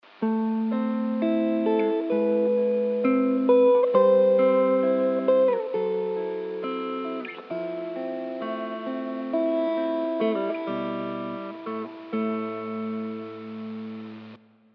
ampli clean, guitar

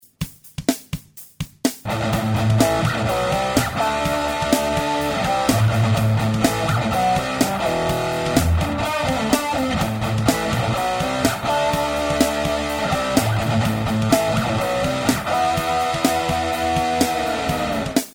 crystal clean riff dist, guitar; amplitube

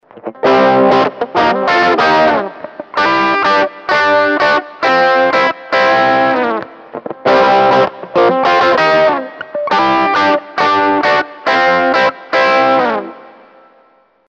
vintage od-1; овердрайва; SMB Tube Talisman; BOSS GT-6

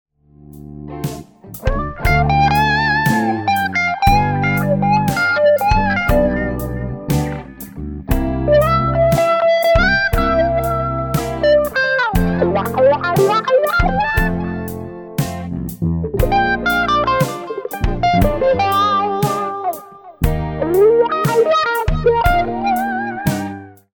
wah, guitar; эмулятора баса; BOSS GT-6; вау-педали

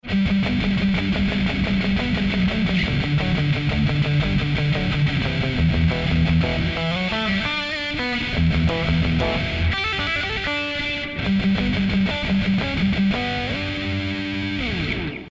80s heavy riff clean, soft combo emulation

